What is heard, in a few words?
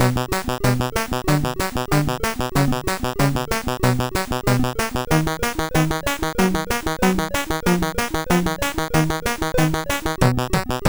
tune, MOS6581R4; Hurt Me tune 1